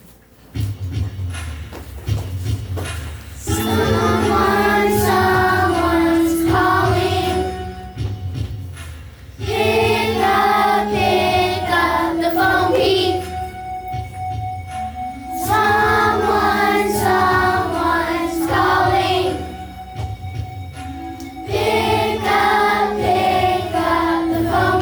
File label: ringtone